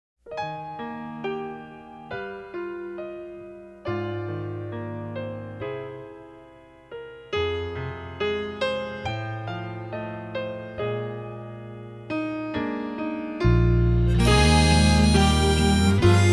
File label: Instrumental